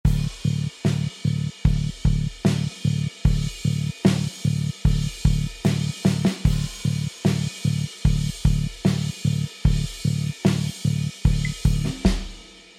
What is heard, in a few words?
drum